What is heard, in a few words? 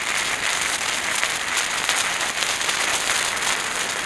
crackle, flambeau; weapons